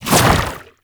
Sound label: Proto Infested Brachiolyst Projectile Whizz By; Warframe; Abilities